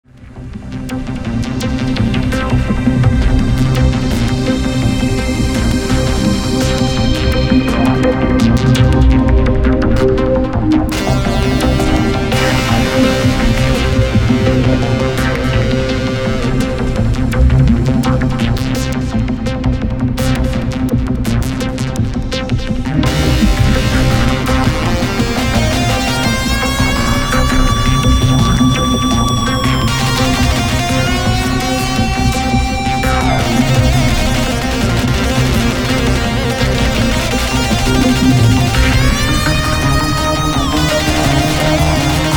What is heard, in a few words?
440 lead, DISCRETE LOWPASS; synthtech; motm 440